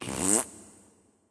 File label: grunt tongue; bacchus